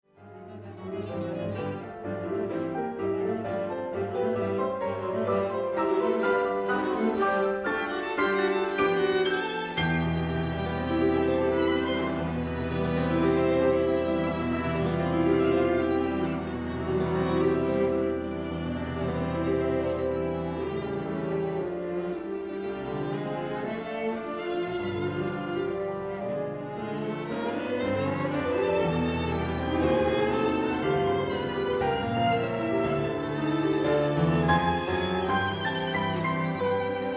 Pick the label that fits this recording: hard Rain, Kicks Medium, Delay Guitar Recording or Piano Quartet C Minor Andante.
Piano Quartet C Minor Andante